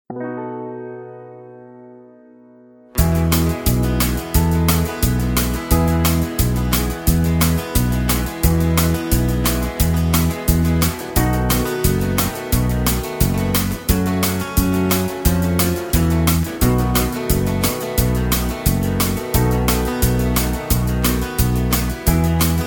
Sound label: (E To F) No Backing Vocals